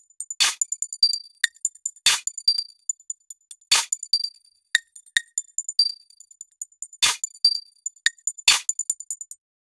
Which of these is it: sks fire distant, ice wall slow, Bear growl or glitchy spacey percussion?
glitchy spacey percussion